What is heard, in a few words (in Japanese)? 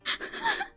笑いをこらえる音; ママになります